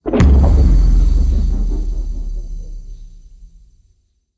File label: super mega win; arcane